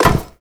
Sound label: metal run